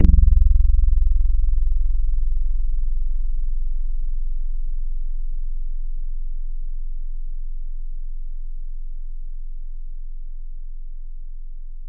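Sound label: Nylon, Guitar; Synthetic's Serum Collection; Echo Sound Works Sphere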